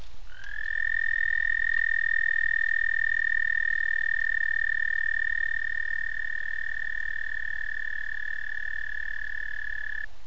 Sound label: Creature Calls